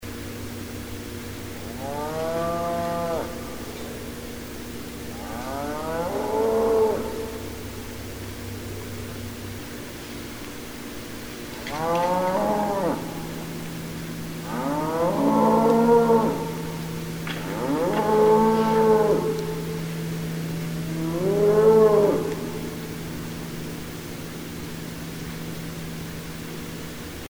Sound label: Cattle